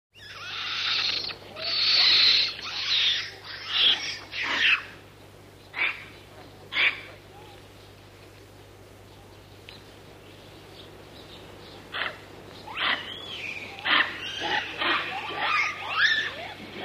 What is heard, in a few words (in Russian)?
zvuk shimpanze na prirode